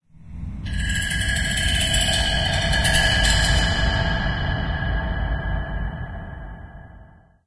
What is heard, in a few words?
amb click stick, ambient organic; source engine; portal2